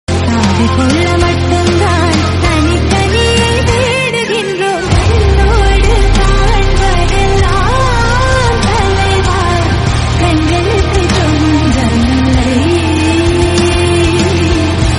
love Tamil

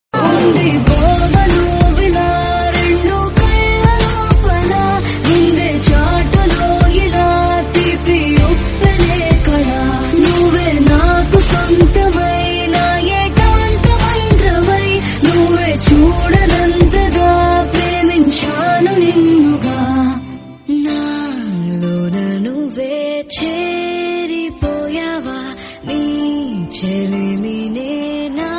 folk

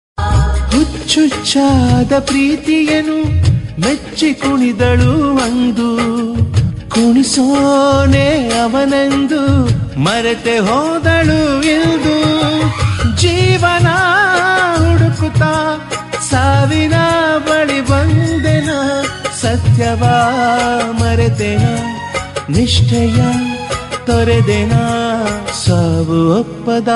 kannada songs

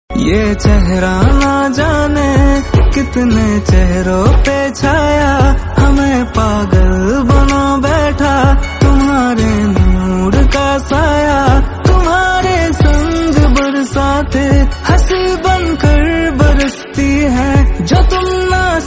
instrumental

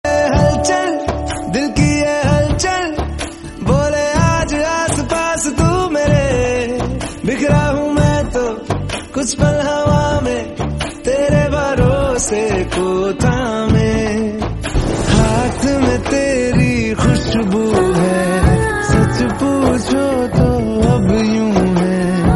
bgm

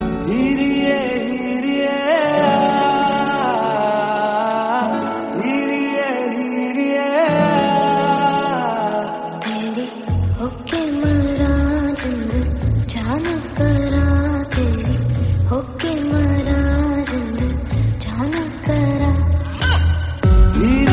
song slowed reverb hindi

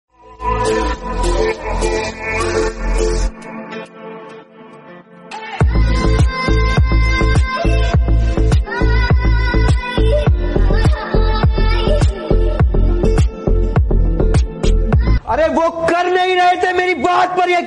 hindi rap song; gyan gaming; free fire

